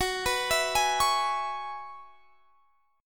Gb 7th Suspended 2nd Suspended 4th, Guitar Standard; arpeggiated; capo 9; chordshape